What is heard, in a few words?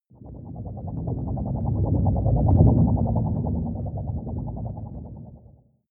whoosh storm wobble hard long; swooshes-swishes